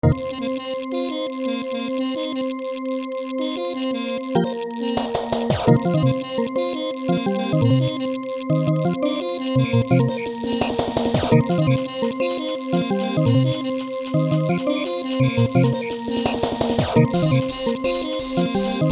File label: samba